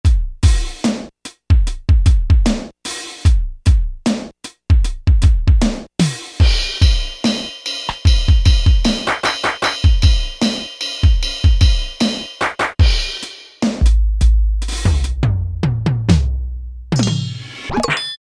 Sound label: DeeEmExKit, Alesis Z1 Hip Hop